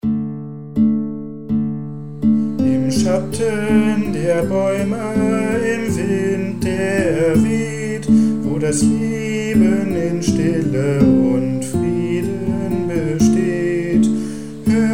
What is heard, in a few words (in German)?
Freie Krieger (Gesang)